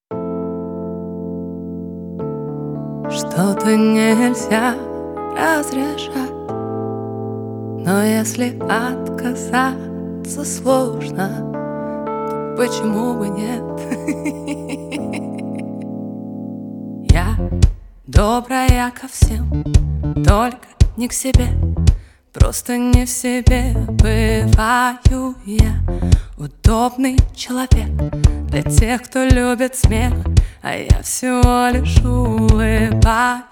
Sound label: acoustic version